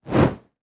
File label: Fire Torch Movement Fast Pass By; Fire and Explosions